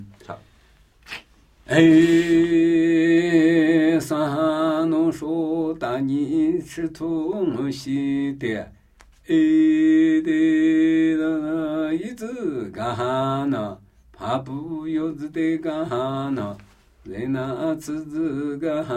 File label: Namuyi Tibetan Ritual Chanting